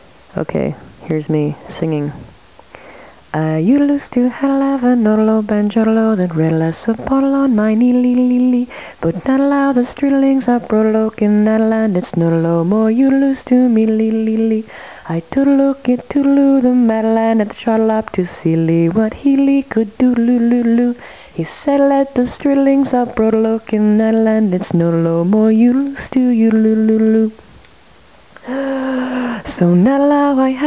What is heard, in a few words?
banjo